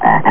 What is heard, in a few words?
bullfrog